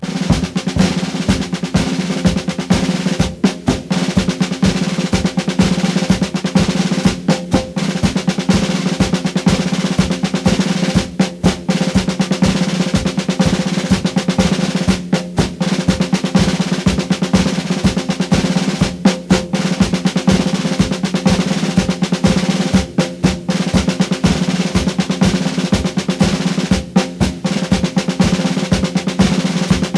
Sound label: roll